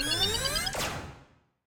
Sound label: wild Win; multiplier mayhem